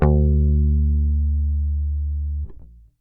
BASS

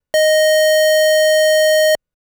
Sub D#5; fleet keys Samples

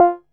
perc line f3; fleet keys Samples

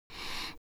br Medium, BreathWav; VOCALOID6 AI Megpoid SOLID Voicebank; Vocaloid 6.5.1

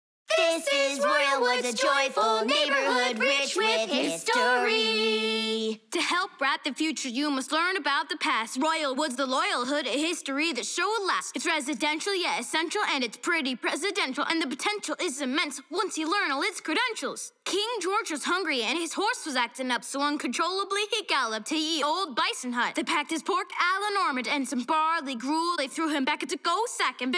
Acapella

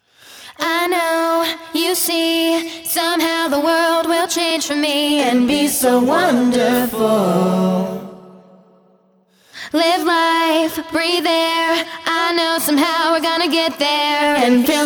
Theme Song vox ONLY; iCarly

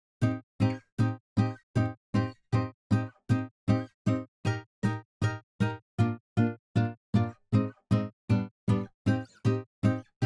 Guitar

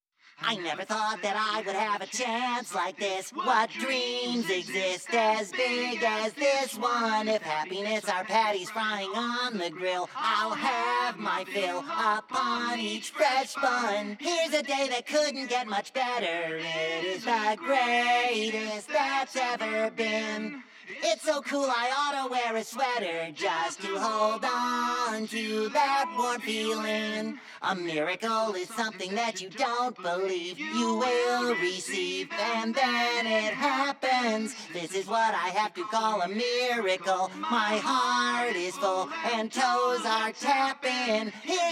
VOCALS

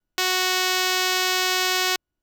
Pulse B F#3; fleet keys Samples

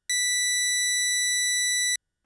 Pulse B B3; fleet keys Samples

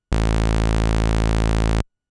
Ramp A1, keys; fleet keys Samples

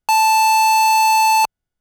Square A5, keys; fleet keys Samples

